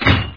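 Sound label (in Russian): лязг железным замком об дверь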